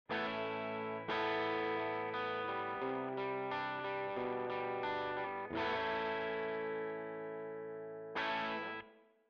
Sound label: ToneX Pedal Reverb Spring